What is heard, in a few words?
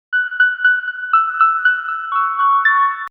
Lullaby